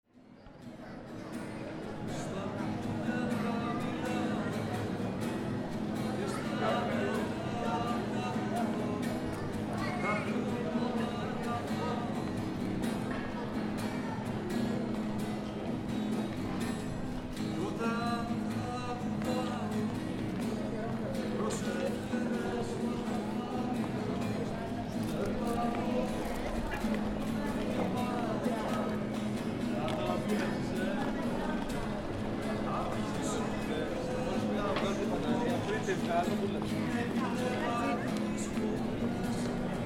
City Street With Busker Athens Greece, People walking; talking, man playing acoustic guitar and singing